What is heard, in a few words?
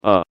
pain sharp; John Madden